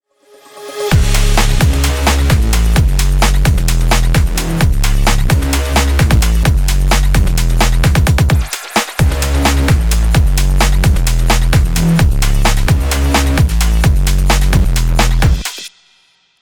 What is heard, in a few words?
sport rush